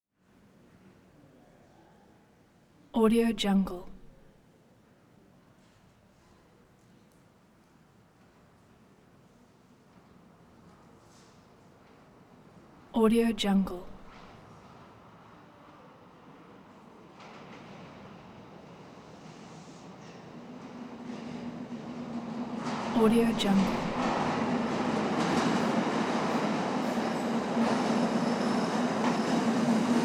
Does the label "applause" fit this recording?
No